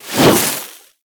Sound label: Arachnoid Camper Terra Ice Proj Whizzby; Warframe; Corpus; Abilities